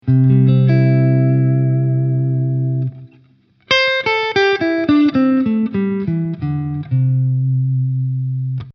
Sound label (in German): C Dur Pent; 1 Pos. down